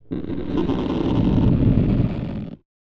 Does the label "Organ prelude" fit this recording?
No